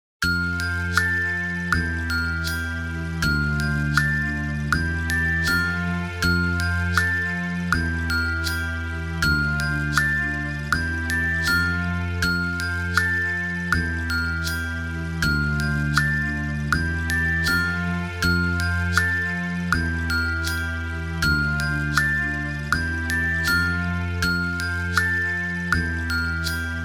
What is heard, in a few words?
CHIME BARS ONLY; Su O Gan